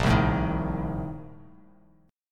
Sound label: A sharp Major 7th Suspended 2nd Suspended 4th, Bass Standard; A#M7sus2sus4; strummed; JGuitar